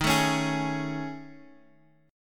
A Major 7th Flat 5th

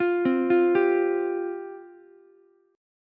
C Suspended 4th C, Ukulele; Csus4 arpeggiated; JGuitar

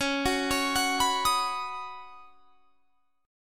Db 7th Suspended 2nd Suspended 4th; Db7sus2sus4 arpeggiated

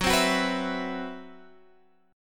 C Major 7th Flat 5th Gb, chord

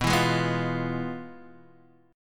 F Minor Major 7th Flat 5th, B

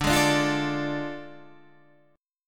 A Major 7th Suspended 4th Sharp 5th

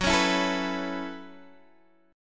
Gb Minor 11th Gb down Guitar Open G

